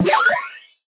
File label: Retro Portal Crossing FX; Video Game; Cymatics; Video Game Sample Pack Vol 1